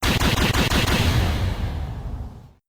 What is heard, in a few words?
guilty